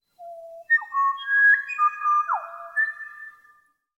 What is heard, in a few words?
Pied butcherbird duo